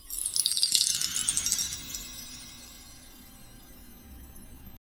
light stone crack opening, magic

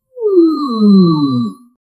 zoom in and zoom out

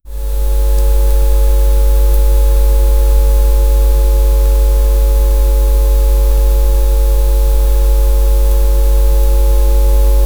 emotional feeling motivat, motivational

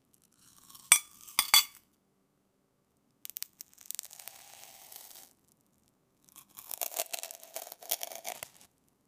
bread turning into gold, glittering

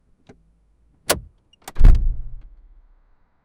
car door opening from dri; doors

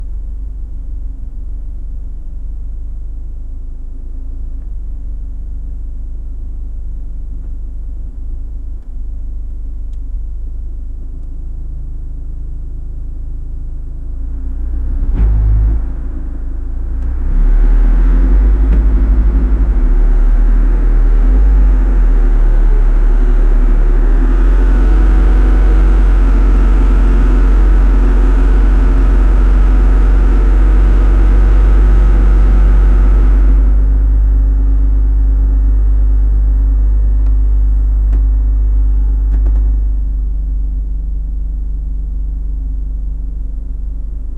low car engine sound with; automobiles

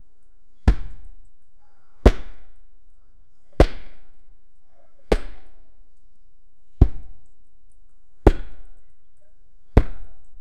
intense shadow boxing in, boxing

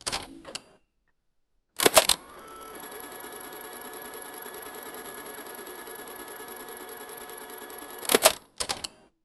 slot machine locking reel

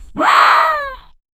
a very cute and adorable

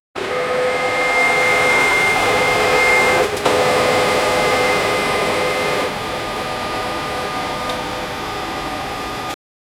moving the crane on rails

